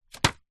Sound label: foley paper airplane land hit surface